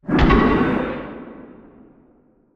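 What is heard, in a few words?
Assembly Conveyor Start Distant; Boomtown; Titanfall 2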